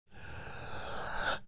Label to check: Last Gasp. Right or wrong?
Right